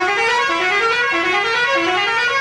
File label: bus horn